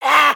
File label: Crab Exert; creatures pets; Lego